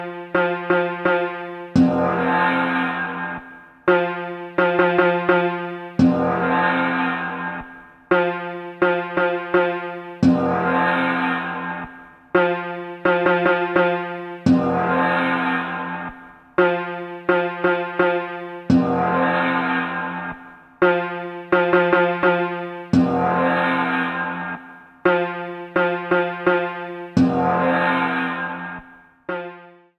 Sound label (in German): Gongspiel; Der Rhythmus-Globetrotter